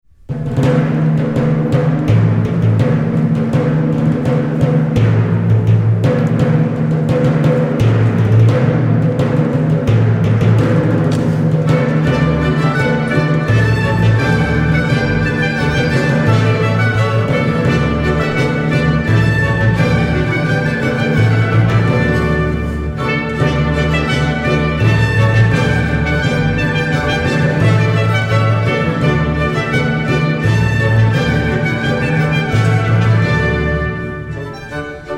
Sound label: Te Deum Prelude; Charpentier